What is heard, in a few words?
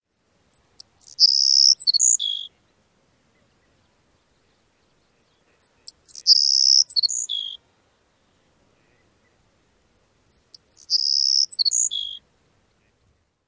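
songs, Savannah Sparrow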